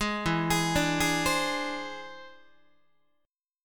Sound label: E Augmented 7th E down Guitar; E+7 arpeggiated; JGuitar